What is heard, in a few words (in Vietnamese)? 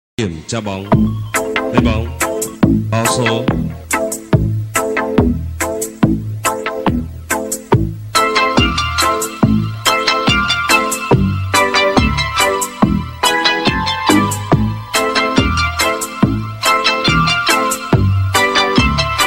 Nhạc chuông xổ số không lời